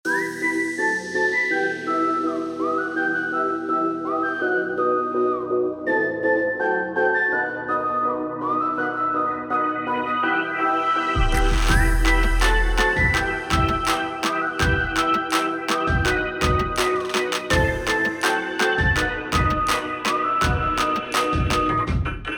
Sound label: Trap